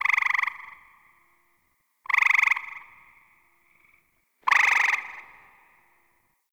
Night Tree Critters; Warframe; Ambience; Eidolon Plains; One Offs

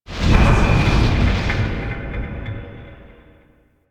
Void Storm Hull Impact; Warframe; Quests; TheNewWar; Ambience; Zariman

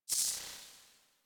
UI Theme Grid Open Two; DE; Warframe; Zephyr

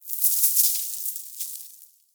Mountain Pass Snow Hit Player; Warframe; Ambience; The War Within

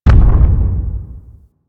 Arachnoid Camper Distant Step; Warframe; Corpus

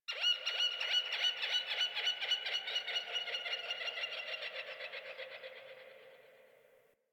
Birds High, Ambience, Plains, Landscape; One Offs; Warframe; Eidolon Plains

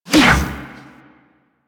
Ball Throw Whoosh; Warframe; Missions; Tenno Ball

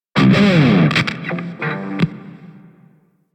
Grineer Shawzin UnEquip; DE; Warframe

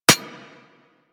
Bullet Impact Generic Metal; Surface

